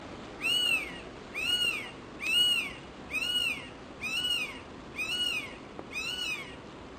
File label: Banded Yellownape Picus miniaceus multiple shouts; Amar